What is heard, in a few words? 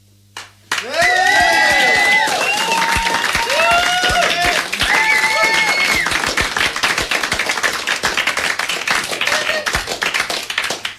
Audience